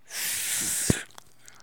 Bubblegum Blow; SFX Kit; Foley - Misc

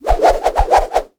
Swirl, Comical Effects; 1001 Sound Effects

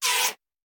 Bullet Pass By; Sound Ideas; Guns

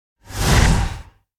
Whoosh By Smooth Heavy Fast; Hollywood Edge; sound designer's toolkit v2

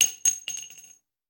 Bullet Shell; Sound Ideas; Guns

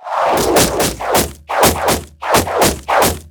Bow And Arrow, Many Arrows, Whoosh Impact; gun and weapon sounds; captain audio; fully loaded

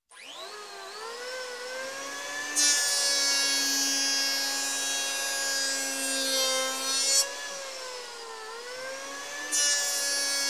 Construction, Plane Electric Plane Start, Plane; Plane Wood; Sound Ideas 2000 Series

